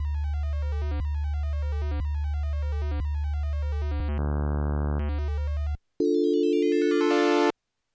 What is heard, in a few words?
MODOR NF-1 Add Harm LFO